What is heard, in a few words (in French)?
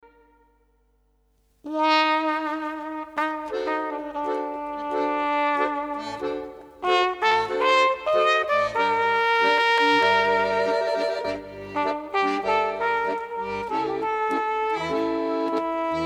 trompette accordeon